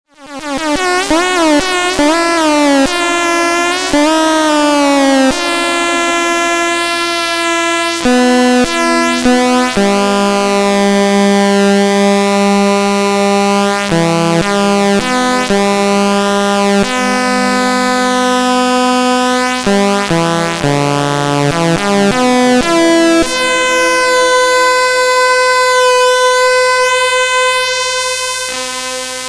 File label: photon clarinet; bentsound